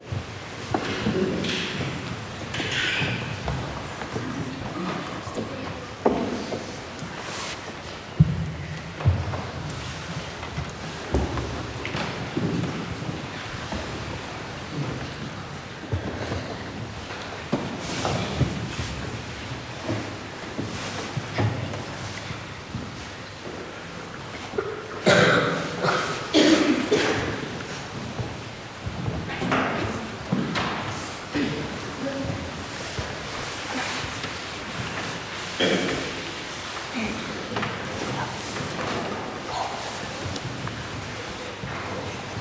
public places church internal ambience quiet few people move around chatter; whispers; felix blume